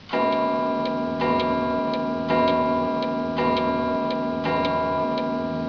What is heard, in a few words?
pendulum; beeps